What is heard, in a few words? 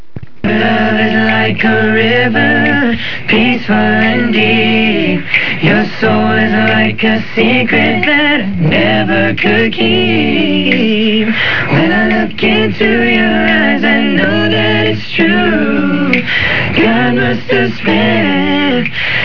acappella